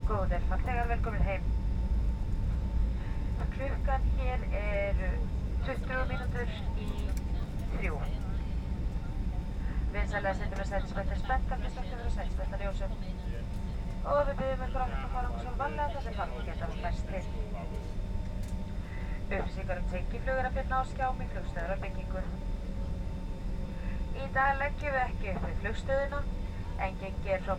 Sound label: Jet interior Aeroplane Flight Landing Announcement, Commercial Aircraft Interior; 344 Audio